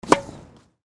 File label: tennis